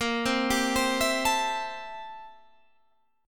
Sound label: A-Minor 7th Bb; Am7/Bb; arpeggiated